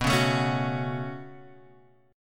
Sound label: B 7th Suspended 2nd, Guitar, Half Step Down